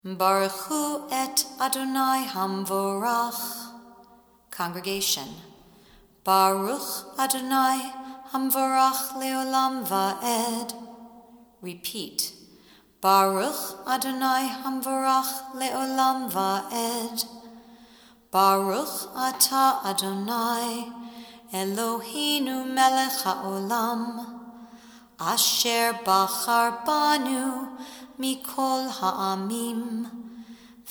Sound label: blessing before chanting torah; ramah